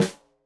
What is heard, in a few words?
Snare Stereo, Drums; Deeply Sampled Drums; SMDrum